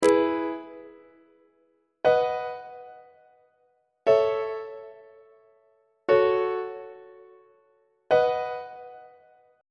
e major half cadence on treble clef

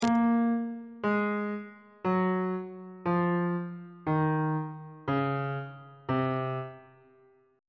c locrian mode descending on bass clef

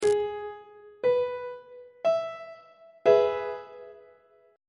f flat major 1st inversion on treble clef

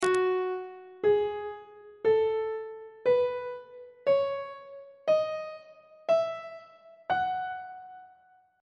g flat dorian mode on treble clef

